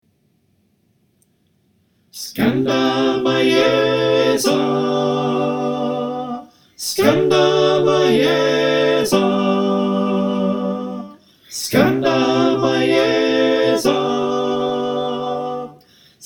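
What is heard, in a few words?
soprano alto tenor bass, choir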